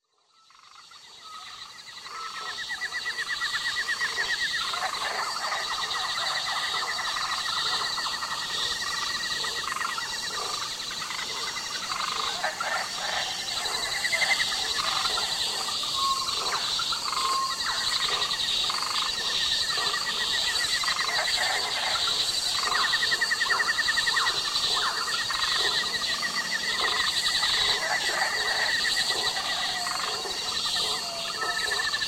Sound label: Night South American Jungle; naturescapes